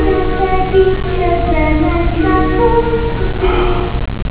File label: waltz